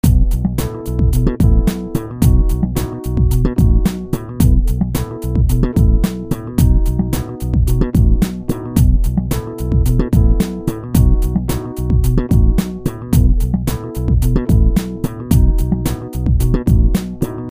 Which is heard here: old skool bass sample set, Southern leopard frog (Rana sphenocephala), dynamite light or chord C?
old skool bass sample set